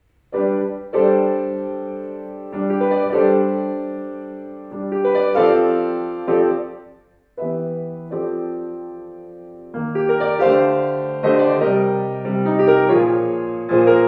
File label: Praeludium - Poco con moto; variationssuite